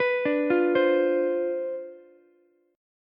C Major 7th Suspended 2nd Suspended 4th C down Ukulele, chord; CM7sus2sus4 arpeggiated; JGuitar